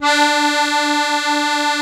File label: ZUPAN CHRO, AKKORDEON